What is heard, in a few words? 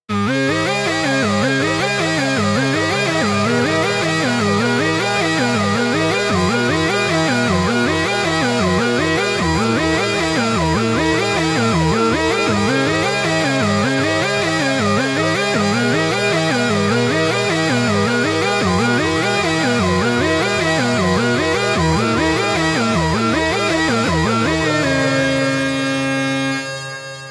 synth alien message